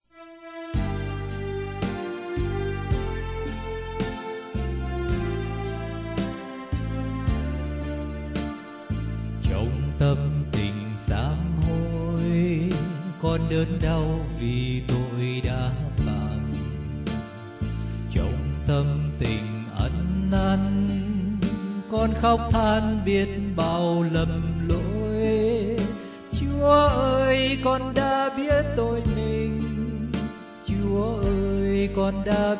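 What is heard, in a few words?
soprano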